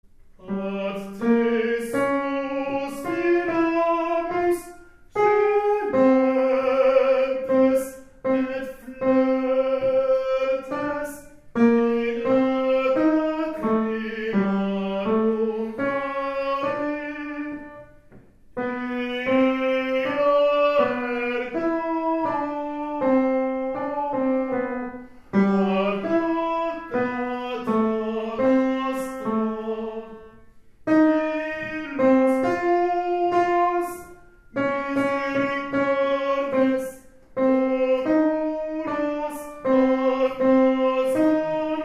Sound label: Tenors; Salve Regina